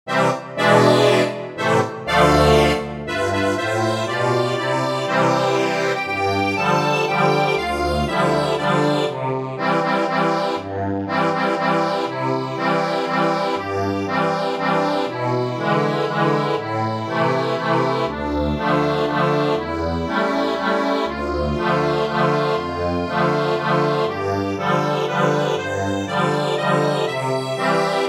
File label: ACCORDION